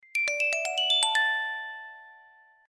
notification; Etuline

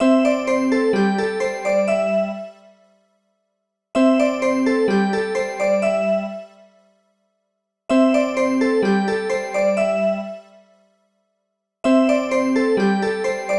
Ring Up; BQ Russia; 5045L-Wallet

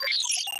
Undock; RED Hydrogen One

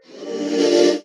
Notification

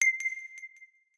Drops, notifications; OnePlus One

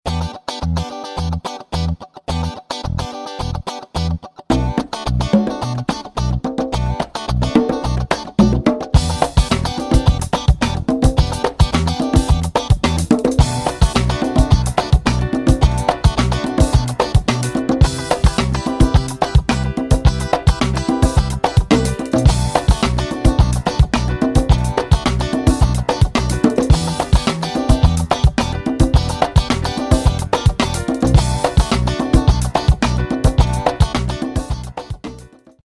Funk, ringtones; Infinix; Hot-12-Play

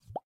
Unlock, ui

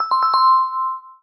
Doorbell

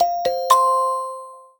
Leaf; Samsung SM-W2019; notifications

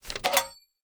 Post box; Samsung Galaxy Pop India; notifications

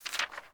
sent message success; Coolpad 7296s; notifications

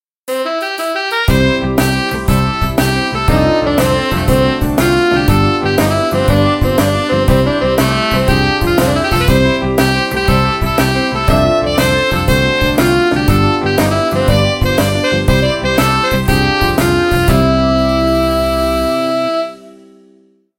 Happy Blues, ringtones; Hisense E602M

